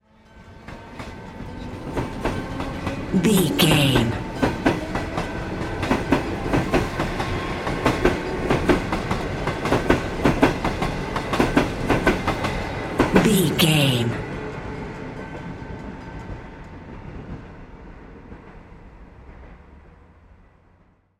train train tracks pass crossing

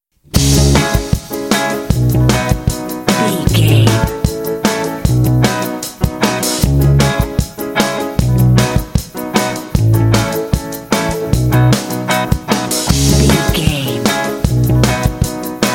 ska craze alt bed

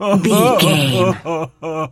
voice male cry low

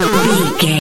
retro teleport warp effect

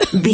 voice female cough short

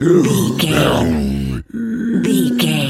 tas devil cartoon

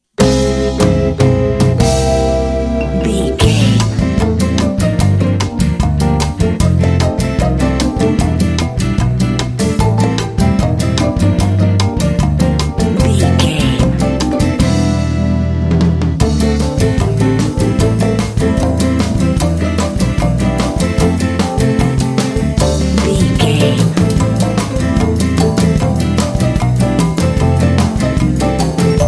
kitchy elevator comedy lounge

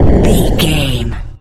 retro explosion bass

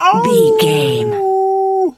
wolf howl short

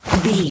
foley object push pull move

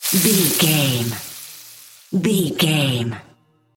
cooking sizzle burn fry

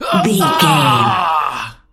voice male death

